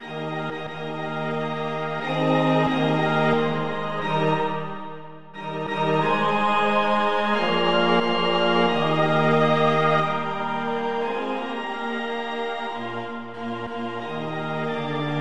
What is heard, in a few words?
polyinstru; hymns; Himnario grande